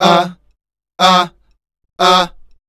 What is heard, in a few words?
WEIRDOS, Voice Spectral